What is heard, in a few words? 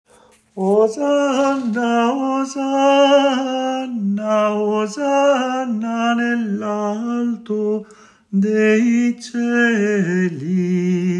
Coda polifonica Tenori; Santo; Santo Liberto